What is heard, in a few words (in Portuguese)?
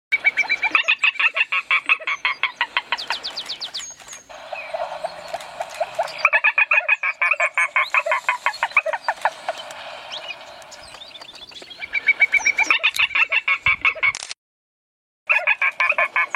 Canto do pinto dagua carijo